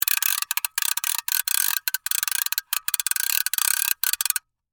Gear Wind Long, Plastic, Clicks; Foley; Clicks (Sound Effects:Objects)

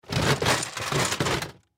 Toy Chest Pull Out Object; Miscellaneous Cartoon; Blastwave; Foley

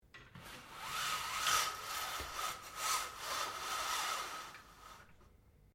BLINDS VENETIAN LOWER SLOWLY, Household blinds; Living Room

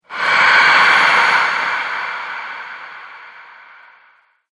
Ghost Breath, scary breathing

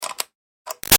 1/4 Inch Plug In Out Electric Guitar; Switches; Objects; Big Room Sound

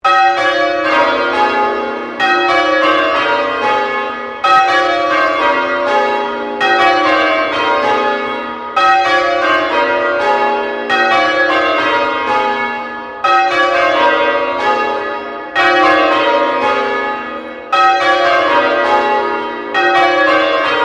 Bell St Pauls Cathedral Melbourne Bells Sequence; The bells of St Pauls in Melbourne; Musical

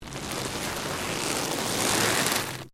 LEATHER MOVEMENT; Material components for constructing more complex sounds; Dressing (Sound Effects:Human)

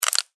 Insect Claw Snap, Movement, Bug; Animal

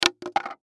Gift Box Lid Drop; Lids (Sound Effects:Objects); Blastwave; Foley

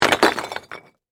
Impact Rock Pile; Rocks; Materials; BlastWave

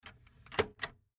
Cassette Deck Close; Technology; Tape Players; Electronics

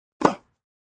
Strong tennis serve as male player grunts.; Sports; Tennis Serve with Male Grunt

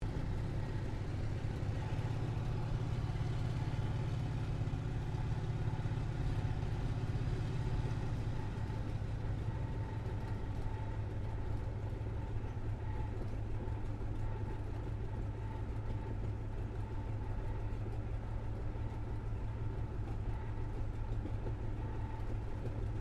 AIRCRAFT CA-28 CERES TAXI INTERIOR; Airplanes; Vehicles

